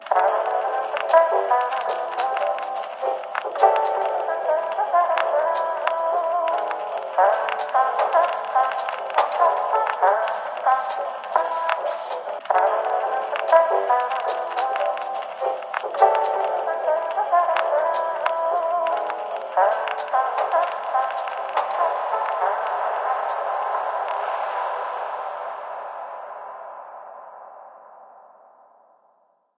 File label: Gramophone; ether one redux gamerip 2015